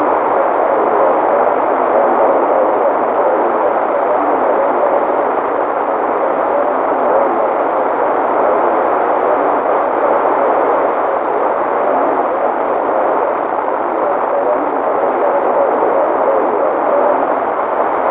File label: bpsk